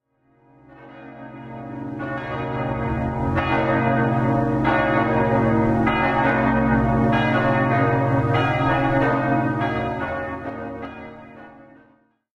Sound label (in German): reformierte kirche